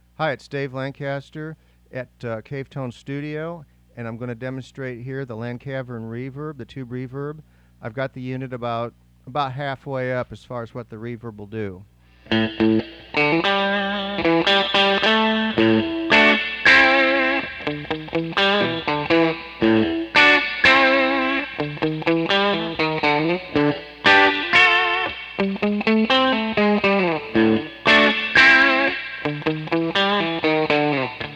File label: tube reverb; Lancaster Lancavern